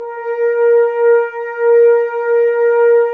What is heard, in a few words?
Brass F.Hrns A#; Miroslav Vitous - Woodwind Brass Ensemble